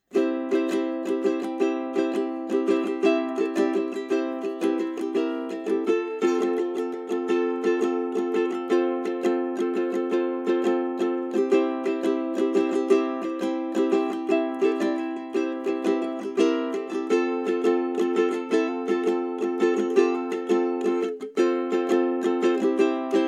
Royer dBooster2 20dB Ukulele ElectroVoice ND267S

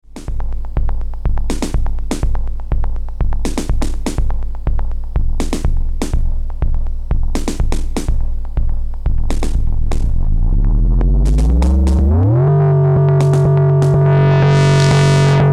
Bassdrum LFO; 1010music nanobox razzmatazz

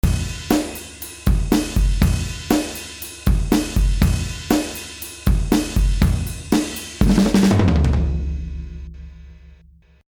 Drums Punch

